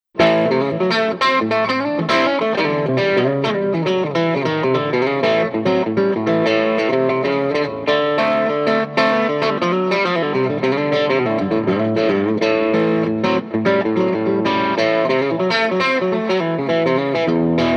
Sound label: strat chicken picken pedal on Overdrive